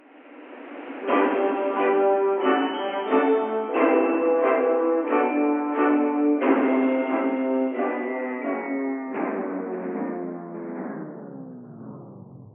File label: Music Record Classic